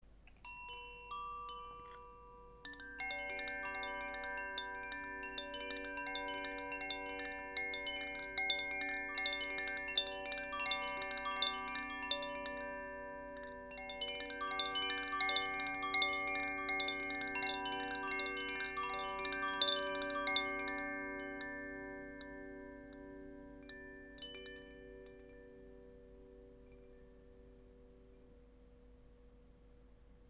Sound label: Koshi